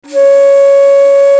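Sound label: bass bansuri, World Winds; E-MU Producer Series Vol. 7 – Old World Instruments; World Combos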